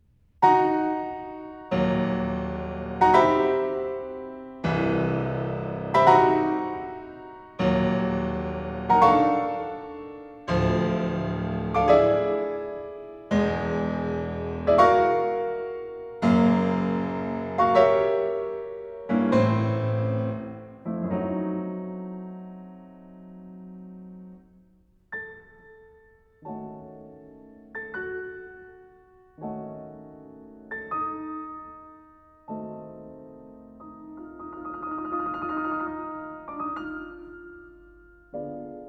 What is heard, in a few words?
for piano, Variations; Poulenc